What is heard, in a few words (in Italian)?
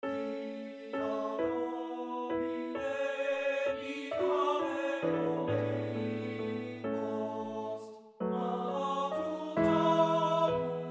Lied per voce e pianoforte.; Rosetti; Karlinchen ein Jahr alt